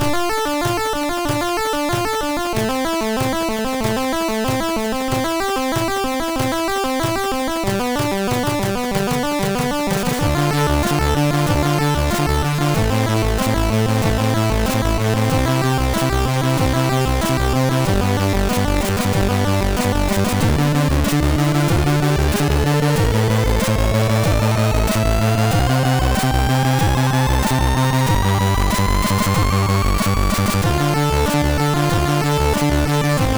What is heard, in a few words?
Rap, MOS6581R2